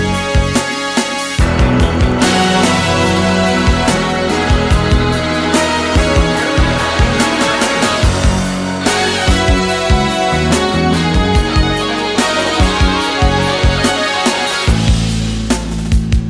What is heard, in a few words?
instrumental